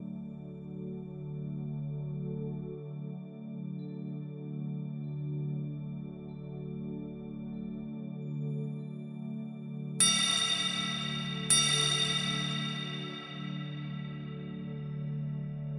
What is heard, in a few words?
reiki healing 3 minute timer 26x 3 minute bells